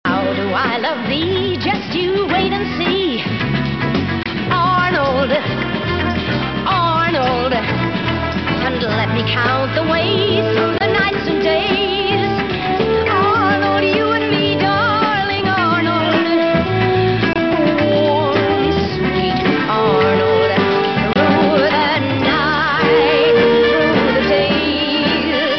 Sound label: Theme Song sung; ARNOLD